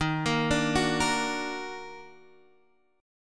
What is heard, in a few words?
D sharp Minor Major 7th; arpeggiated; chord; x,6,8,7,7,6